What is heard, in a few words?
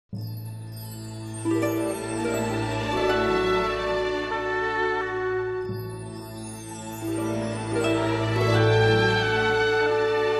Duet